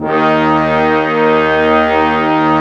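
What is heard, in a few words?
Section, Brass Large Ensemble long; Roland L-CDX-03 Disk 2; Orch.Ensembl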